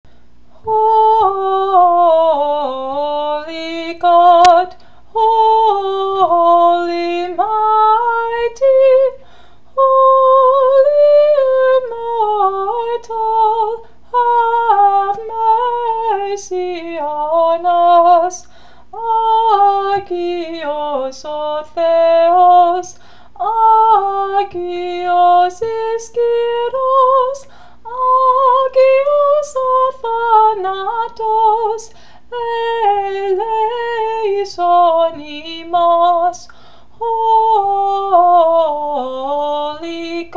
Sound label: Trisagion Hymn English & Greek